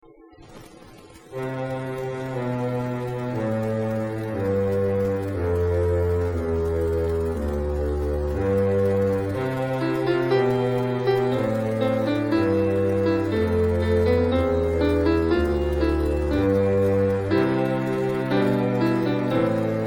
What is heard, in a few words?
Basso